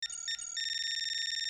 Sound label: processing, computer